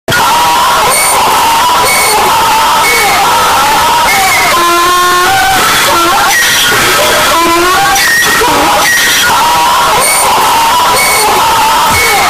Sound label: laugh it up earrape; fortnite